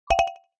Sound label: Click error